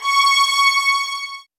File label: SOFT VIOLINS; Q Up Arts; Denny Jaeger Master Violin Library